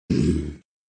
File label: Zombie Warn; bobtherobber2